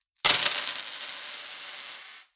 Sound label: Coins Thrown On Counter